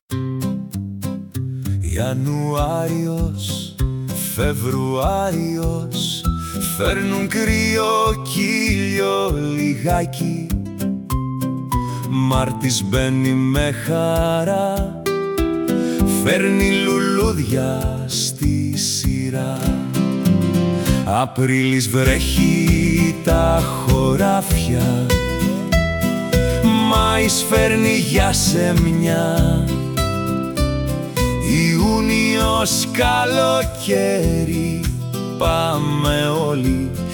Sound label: campfire acoustic; the months of the year